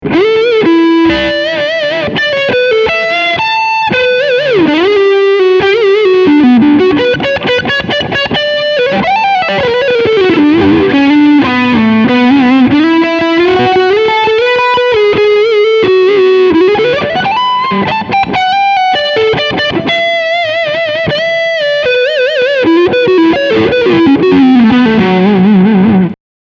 Front HB Leadsound

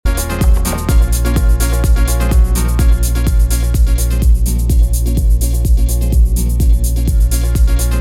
Reloop RMX 10BT Mitten raus

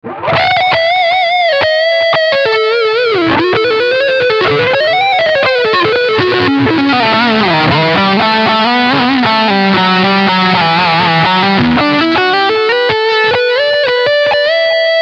Leadsound Front HB

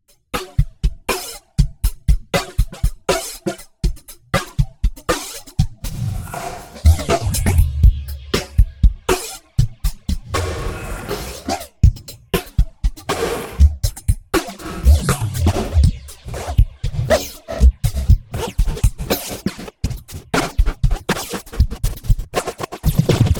EFFEXX RT1701 Drums Rotierende FX; Radikal Technologies

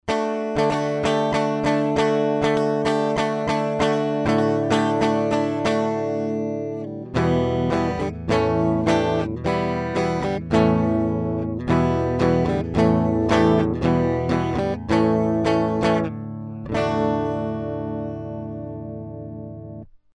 MOTU M4 Gitarre

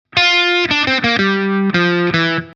Gm 6 Pentatonik über C9

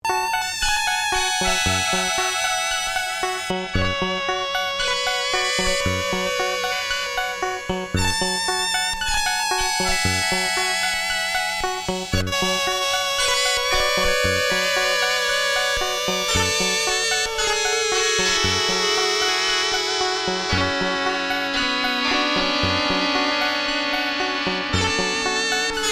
PPG Wave Computer 360 Sequencer 350 Wave 2 Leadvariante

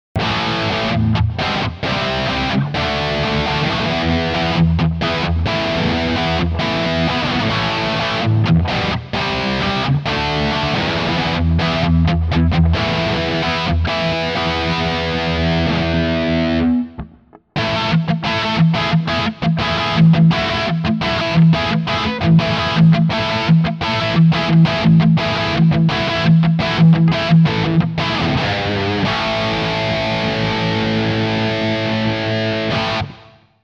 Les Paul 60s Steg Pickup Highgain Rhythm

